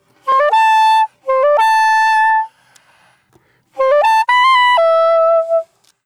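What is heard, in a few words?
Sax Solo, саксофон; духовые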